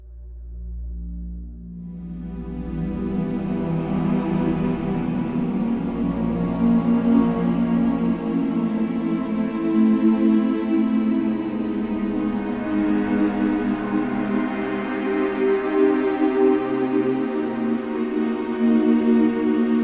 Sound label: Ambient Ancient shadows; Shadowgrounds; windows gamerip 2006